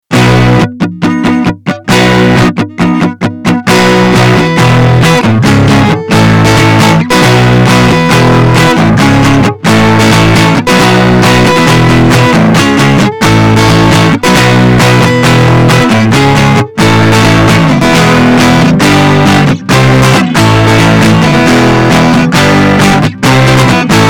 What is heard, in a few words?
guitar clipping